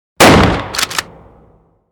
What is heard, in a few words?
sound logo